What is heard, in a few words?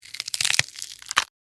Flesh Rip Tear, Bone, Crack; Horror; Blood and Guts